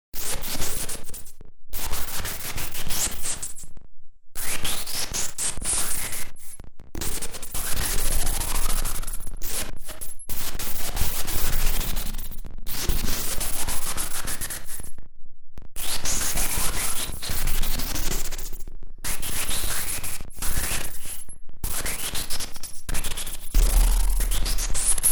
Sci-Fi Radio Tuning, Tuning in futuristic radio.; Communications

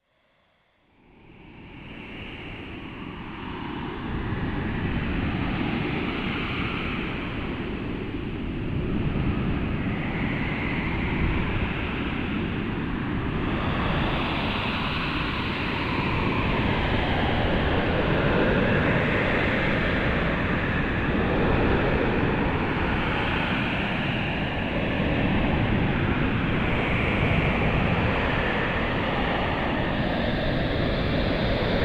Storm At Sea Nature Ambience; Ocean Sound Effects

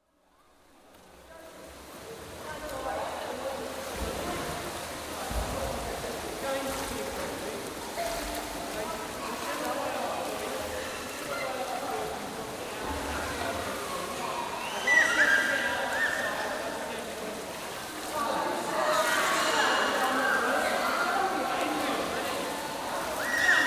Swimming, atmosphere in crowded indoor pool; Pools; BBC

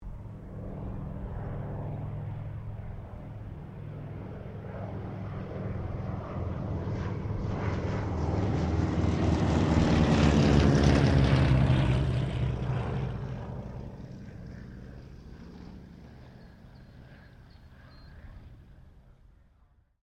C195 squadron 2 pass, Squadron of 2 Cessna C195s; Airplane; Vehicles; Aircraft

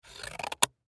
Scissors Cut, Scissors Cut Cardboard; Scissors (Sound Effects:Offices); Foley; Blastwave

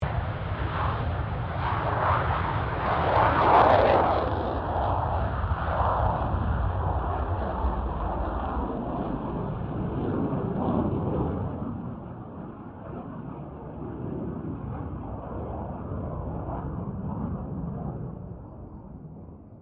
FA-18 Hornet takeoff distant; RAAF FA-18 Hornet; Airplanes; Vehicles

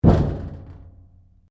Strike Metal Tank Exterior; Materials

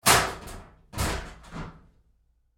Door Locker Open Roomy Med, Metal Door; Doors

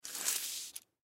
Coin Purse Slide; Money; Foley; Blastwave

